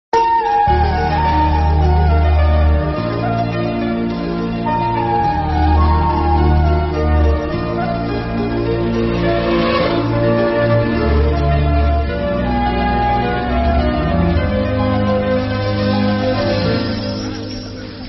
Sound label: bgm telugu; acharya; chiranjeevi